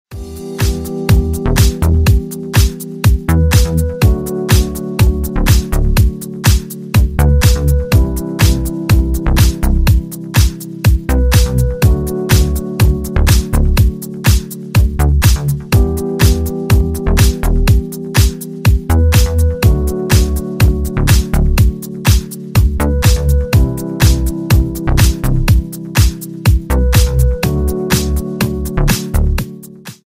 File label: instrumental